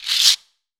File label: maracas; AKAI S-Series CD-ROM Sound Library VOL-5